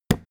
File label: wood hit hand